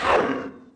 spirit hurt; FlyForInsanity

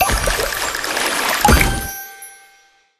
fish swim striped; Candy Crush Friends

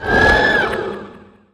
skill wand dark scream; FlyForInsanity

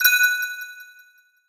Item Drop Ding; FlyForInsanity

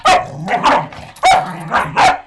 spaniel growl bark

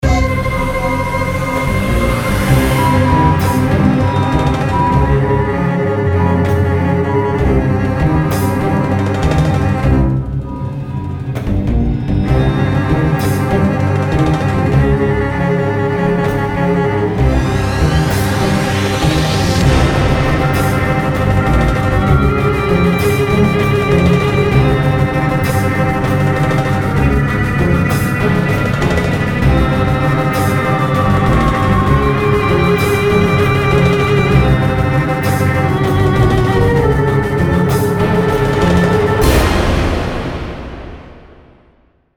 Bgm NPC Horror; Fly For Insanity

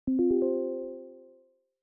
Windows Notify Calendar

